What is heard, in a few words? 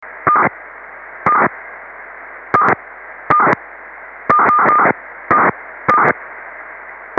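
pager strange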